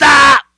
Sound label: Synths C#; Vengeance Samples